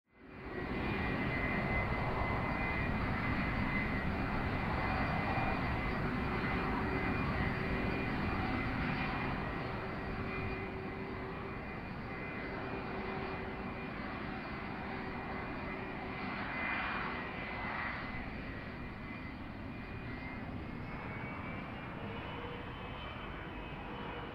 Aircraft Boeing 757 before take off goes to the runway